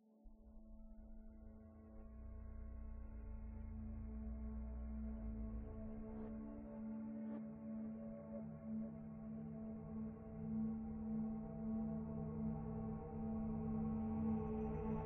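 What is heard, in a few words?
Audio Drone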